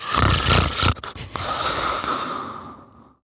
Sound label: Snores, Son, Vocalizations; Warframe; Deimos